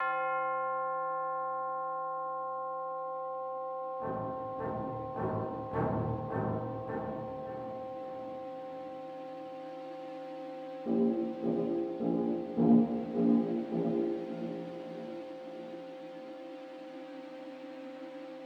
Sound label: Brass Ambient; pzserver